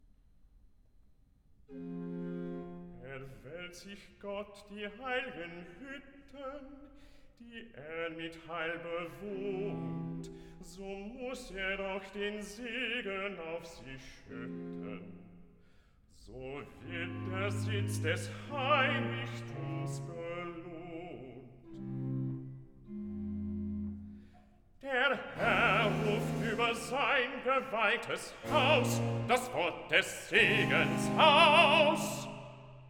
Recitative; Bach; BWV 34; O ewiges Feuer; Cantatas; DGG

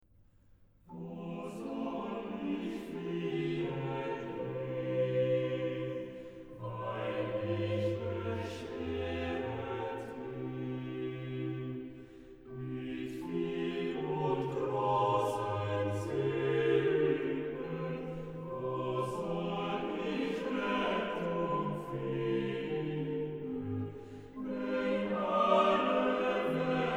Chorale, organ; BWV0005; Wo soll ich fliehen hin; BACH; CHORALE PRELUDES

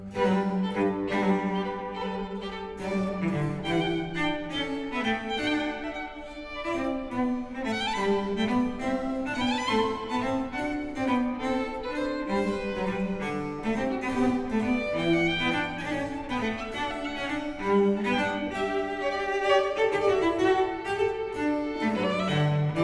Variation 7 al tempo di Giga, strings; Bach; Goldberg Variations; Sitkovetsky; NES Orch

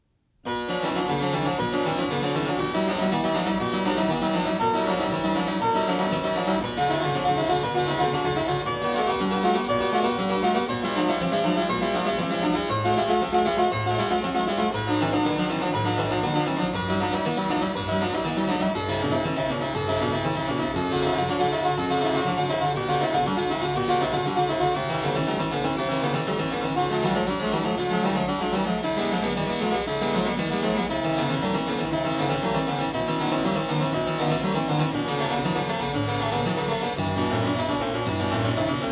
prelude; BACH; WTC 1; Prelude 2; BWV 847

